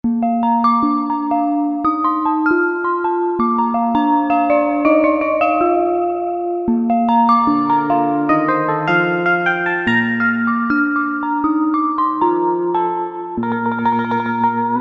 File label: Ring Bell; V-Synth